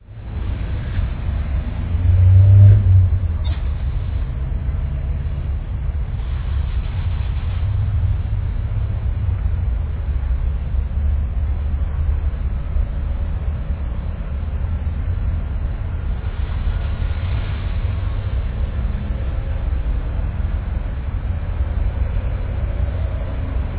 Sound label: traffic